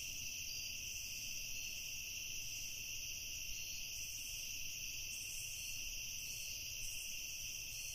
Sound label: crickets; butterflysoup2